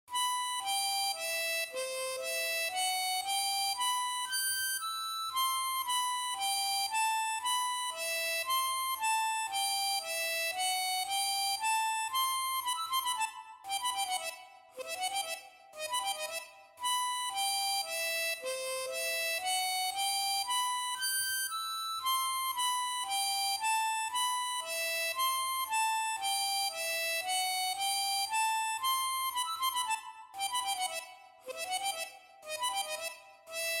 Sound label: Soliloquy for Chromatic Harmonica; Harmonica Basic SF by The SFMaker